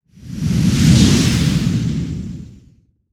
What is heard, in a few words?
epic swishes